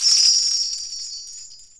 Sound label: BELLTREE